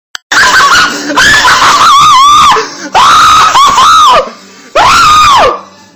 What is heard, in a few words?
hahahahahhaha